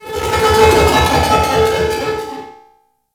Head Track Zoom In; Hub; Simaris

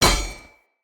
Shield Impact Default; Weapon Impacts; Enemy; Shields; Sinecure Shield

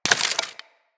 Bullet Impact Debris Wood

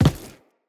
Crew Footstep Default; Railjack Crew; Steel Meridian

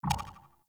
UI Default Theme Error

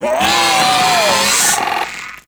Arachnoid Wraith vocal death; Warframe; Corpus

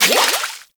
Water Fight Sprinkler Ammo Pick Up; Warframe

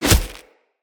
Kick Impact Default; Envoy

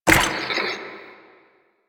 Play Next Video; Warframe; NineteenNinetyNine; Stage6TheCatCameBack

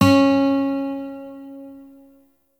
Shawzin Oct Two C Sharp; Warframe; Lotus Shawzin

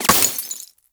Laser Impact Glass Large; Warframe; Bullet Impacts

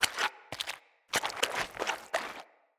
Stuck Beaver Dig; Encounters; Animal Rescue

